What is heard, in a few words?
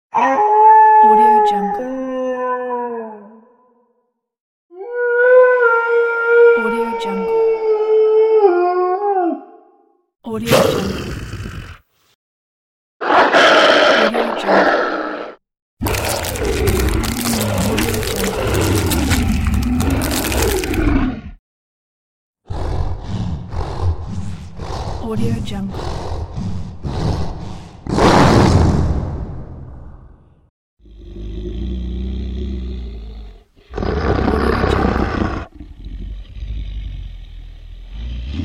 werewolf sounds